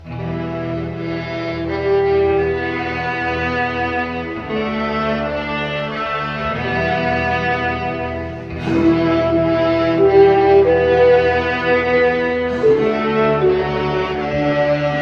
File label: strings, Loops, Sad